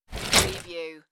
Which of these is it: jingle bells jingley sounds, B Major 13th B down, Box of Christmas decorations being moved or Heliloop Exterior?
Box of Christmas decorations being moved